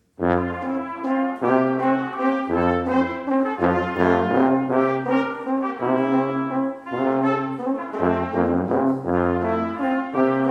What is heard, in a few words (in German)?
Alphorn Walzer